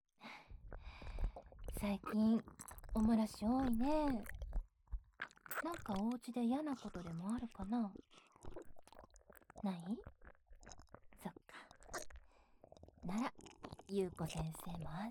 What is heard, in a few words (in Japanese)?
耳舐め 手コキ ベロチュー フェラ 手マン 騎乗位 音声; 世話好きゆうこ先生に; 幼少期に手コキされ、数年後に再会して手コキ・おっぱい愛撫・ベロチュー・フェラ・手マン・騎乗位してもらう音声